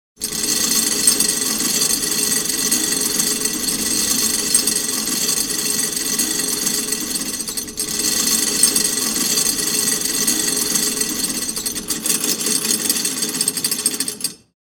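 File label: chain pulley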